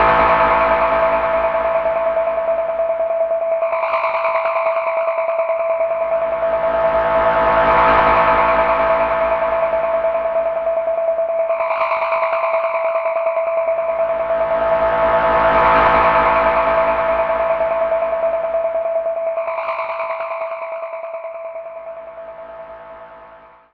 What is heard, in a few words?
Radar A, Propeller Drones; Zero-G Seismic Frequencies